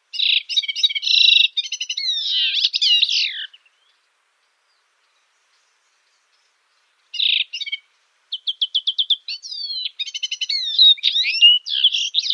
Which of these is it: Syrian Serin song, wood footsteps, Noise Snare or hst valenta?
Syrian Serin song